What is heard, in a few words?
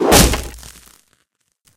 arrow impact wood; tf